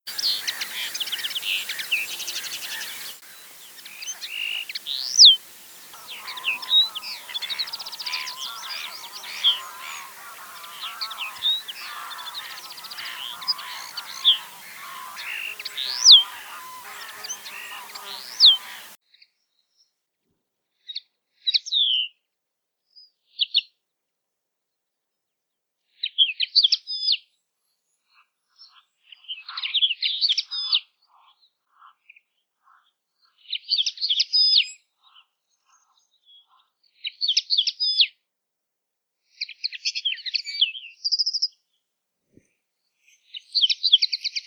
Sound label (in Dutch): kneu zang